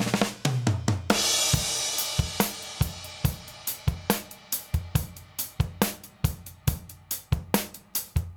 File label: GRV 70; AMG Gota Yashiki Groove Activator